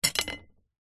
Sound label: industrial wallpaper scraper set down on concrete surface; zapsplat